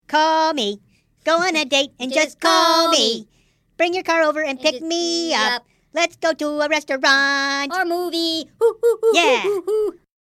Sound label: Funny Date Song